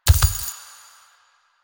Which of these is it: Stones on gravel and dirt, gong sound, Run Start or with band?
Run Start